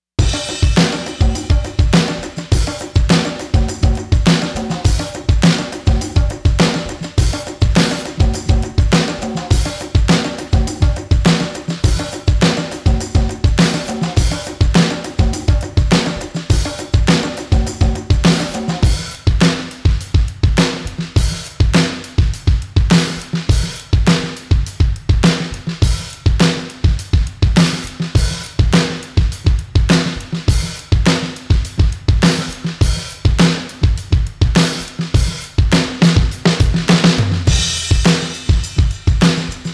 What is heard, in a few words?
Drums SN Uncompressed